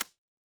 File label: feedback achievement